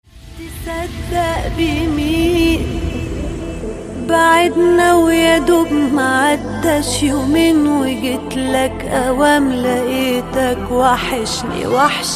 arabic song

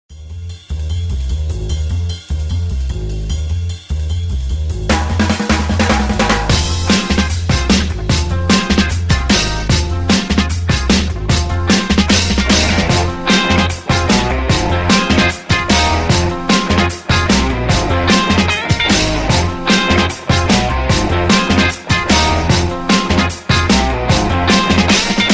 drum

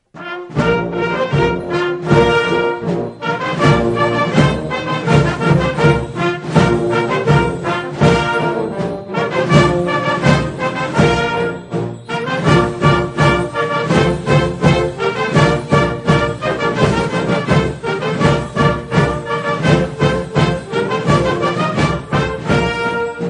musketeers march

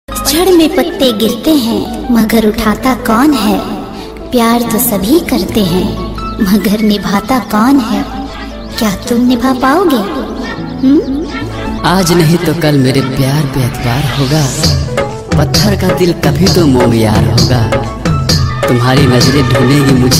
love ring ringtone

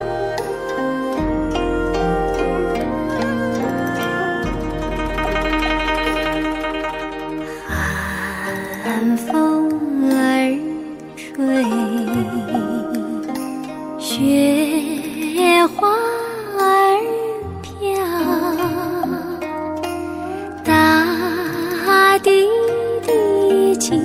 tradition chinese ringtone

